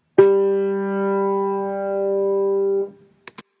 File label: tenor G